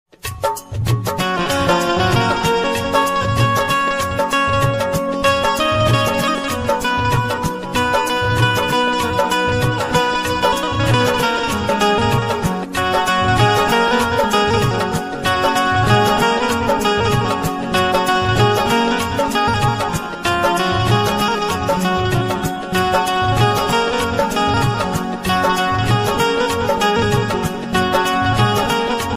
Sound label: bhakti ringtone instrumental ringtone